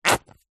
household hair gel squeeze from plastic pouch squirt; zapsplat